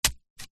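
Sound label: wood stake sharp post drive by hand into dirt shallow; foley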